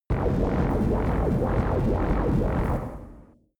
space inside biomedical alien spaceship busy

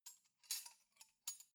cutlery knives handle very soft

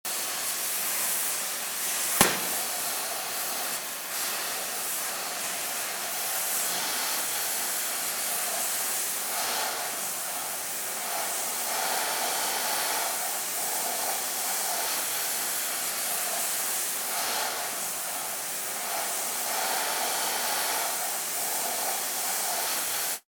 burner

